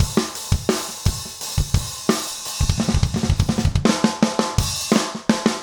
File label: rock 85